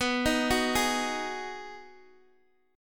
B 6th B down Guitar Open D

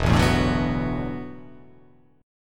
E 9th Suspended 4th E down

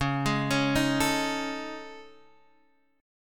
A Major Sharp 11th C sharp

